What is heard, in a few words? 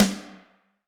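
Snr Reverb, snare; Alesis D4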